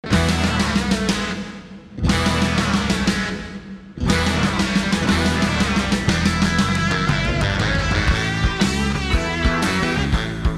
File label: hard rock